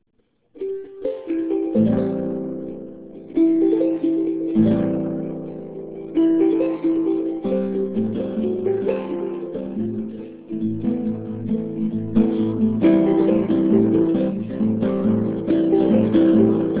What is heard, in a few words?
charango with others